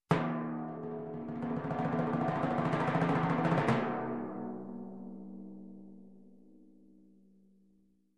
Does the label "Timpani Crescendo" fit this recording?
Yes